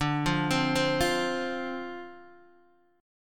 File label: D-Minor 7th D Guitar DADGAD, chord